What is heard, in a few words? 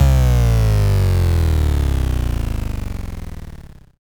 Bass Drop; SoundsCrate